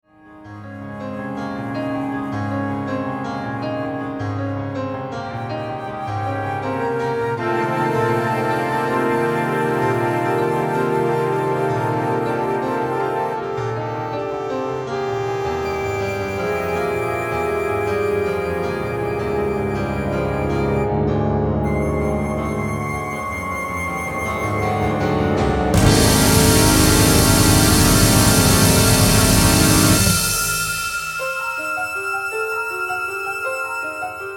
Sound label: transition sample accompaniment